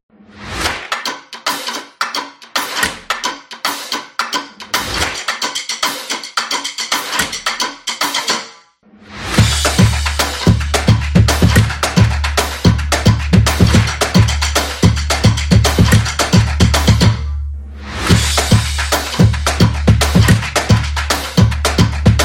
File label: Drum Energetic